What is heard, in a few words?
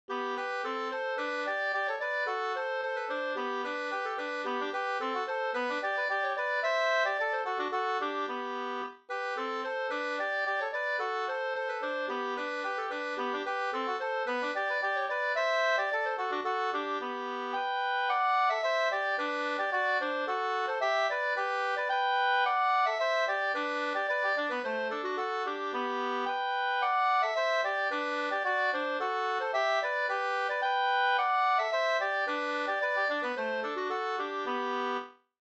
polka